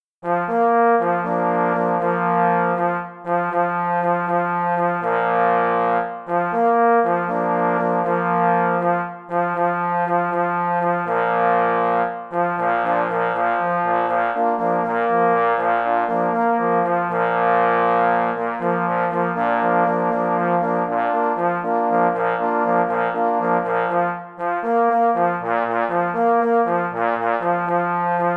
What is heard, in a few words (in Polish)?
Fanfara dla wyzla weimarskiego na 3 paforsy; G. Hockert; pies w muzyce lowieckiej